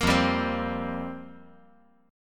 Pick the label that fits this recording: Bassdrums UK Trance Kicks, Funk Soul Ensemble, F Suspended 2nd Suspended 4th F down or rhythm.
F Suspended 2nd Suspended 4th F down